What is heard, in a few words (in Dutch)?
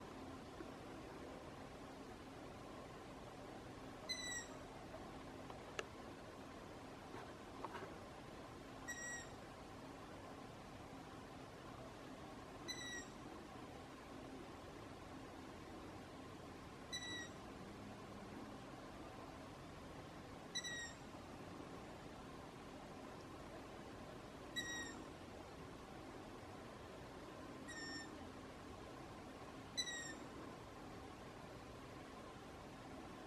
Ransuil Asio otus fiepende juv.